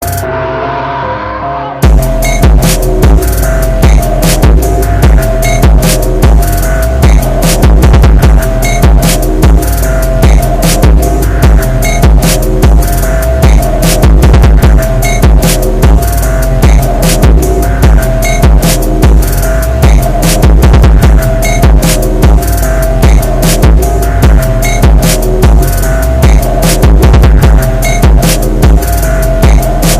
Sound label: type beat; HADES